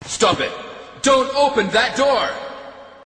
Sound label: door